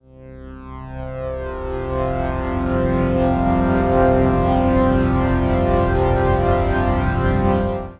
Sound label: Vortex Pad; Sonic Foundry (Sony Creative Software); The Electronic Music Manuscript Collection